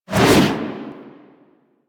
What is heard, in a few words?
Generic Spin Whoosh, Foley; Characters; Warframe